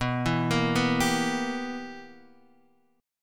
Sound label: B Major 7th Suspended 4th Sharp 5th, Guitar Open D chord